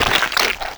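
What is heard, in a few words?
cerberus hit, creature; GammaAge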